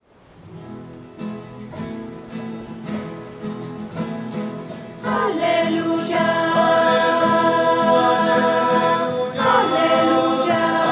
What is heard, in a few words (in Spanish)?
voces; aleluya en mi; misas 2012